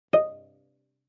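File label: Cello pizz fortissimo sul A Eb5; MIS Pitches - 2014; Strings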